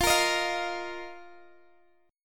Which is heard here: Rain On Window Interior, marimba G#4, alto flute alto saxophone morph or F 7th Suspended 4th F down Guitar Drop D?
F 7th Suspended 4th F down Guitar Drop D